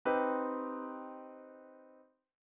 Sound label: CmM7